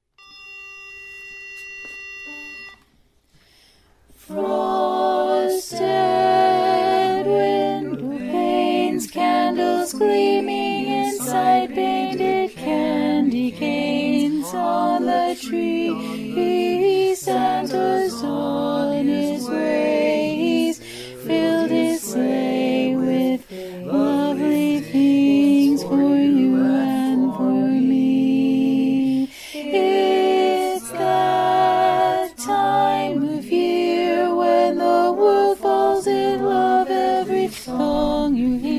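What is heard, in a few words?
tenor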